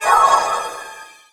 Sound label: Pickup Magic; SFX Kit; Pickups